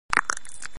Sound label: Suck; Lewd SFX